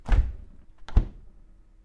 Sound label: Fridge Door; ld25